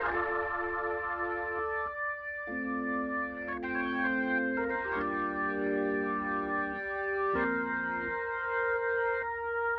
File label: Organ